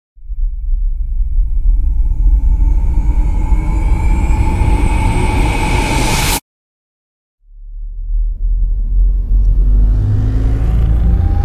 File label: Reversed Risers; Epic Tools vol. 1